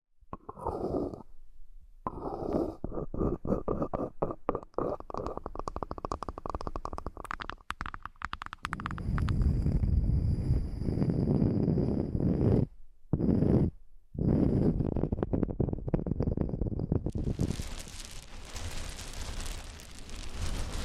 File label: asmr the ultimate sleepy wood triggers; Sounds of Nature; no talking